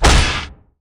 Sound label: hit; silpheed; Akeron